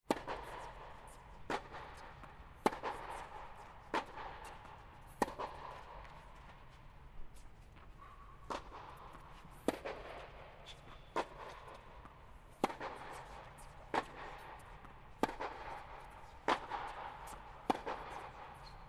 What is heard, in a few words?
Tennis In Dome Close And Long